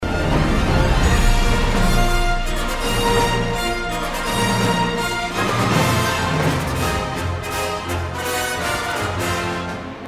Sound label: Solo